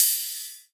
Ride; maschine

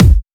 BD

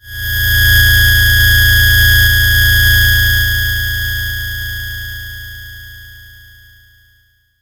NOISE FX

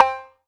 Percussive FX

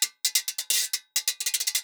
Guira 130

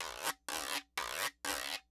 guiro

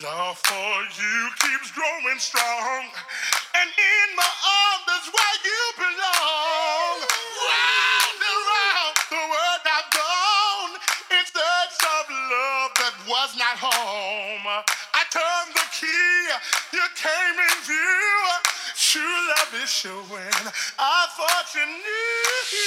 couplet, salsa

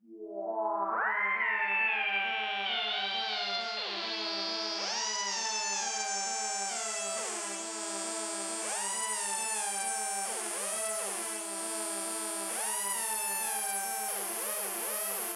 nord alarm

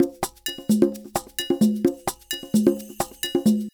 Loop Percussion 130

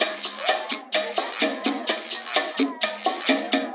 Percussive Loops

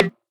PERCUSSION

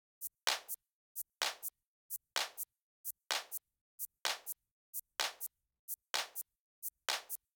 tchiclap

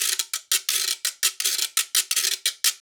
guiro

